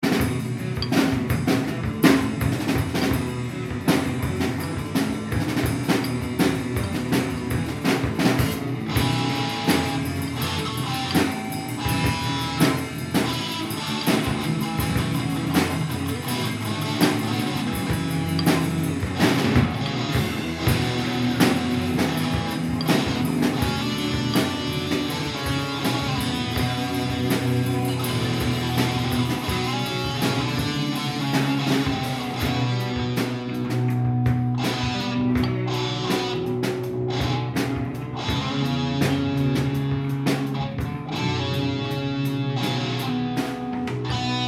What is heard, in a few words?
Distorted Duel